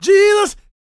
GOSP MALE, Vocal Gospel; Vocal Planet Vol1 Gospel

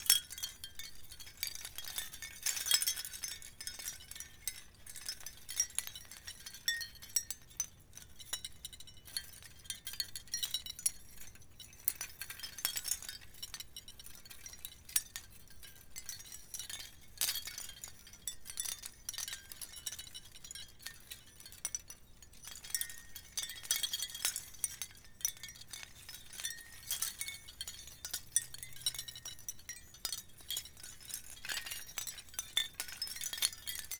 Twinkle Chime one shot, Ambient Twinkles

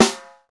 SNR, Roland; Real Snrs

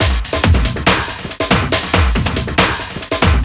Beat, Drum N Bass

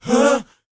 BOYZ A, Vocal Planet R&B & Dance; ILIO

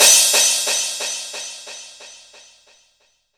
crash, Korg Electribe ER-1

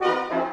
Orchestral Loops; 128 VERTIGO

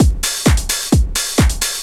drum 130 trance

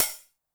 open hat Short Decay, 909; classic machines; 909mod; OH - OPEN HAT

